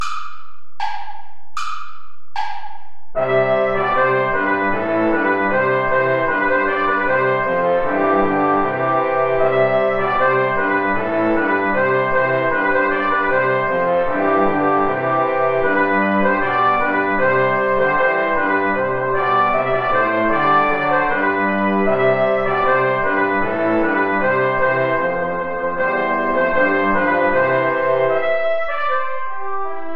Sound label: play along without trumpet; deck the halls; traditional